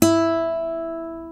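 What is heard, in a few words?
Nylon Gtr E3 Op Med; Mo' Phatt; Digital Sound Factory Mo' Phatt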